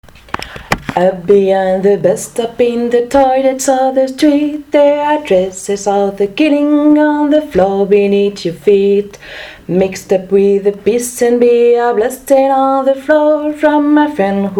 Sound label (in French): basse